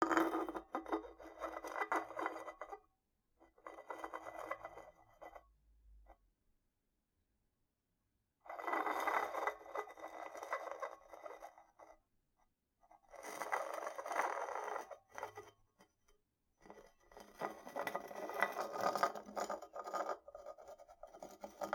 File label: Can Drag, Drinks Can